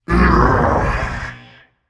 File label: ugh, voicepack; smite; jorm